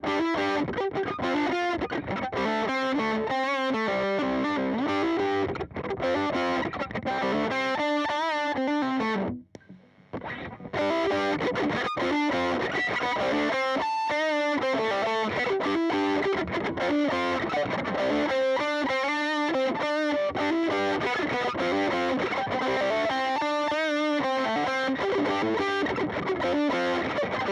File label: Overdrive Stacking Booster Fuzz